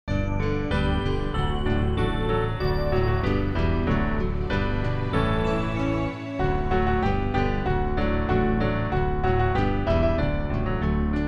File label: backing; New Hope Music